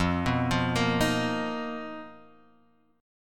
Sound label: B Major 7th Flat 5th F, chord shape